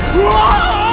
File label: sample, male laugh